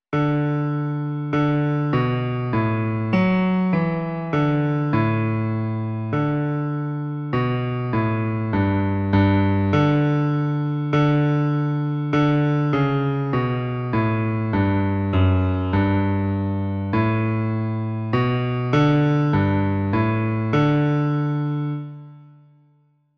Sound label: Bass